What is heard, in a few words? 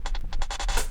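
LOOP hi hat, Drum & Bass, 168 BPM; Ueberschall; Drum & Bass Resonance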